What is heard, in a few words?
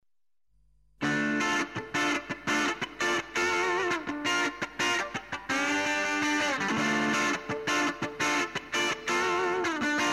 Rock Intro